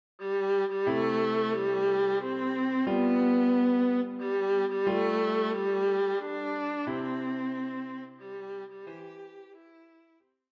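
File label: viola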